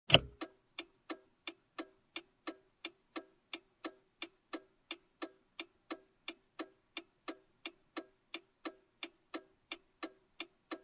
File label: Car Interior Turn Signal On Run Off, Car Interior, 1996 Acura Integra, Turn Signal, Turn On, Run, Turn Off, No Engine; Transportation Automobiles